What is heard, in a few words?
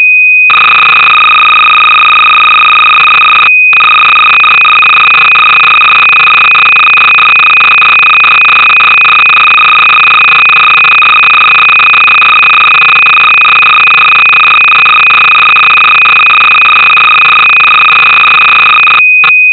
CLOCK